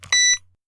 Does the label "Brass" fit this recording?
No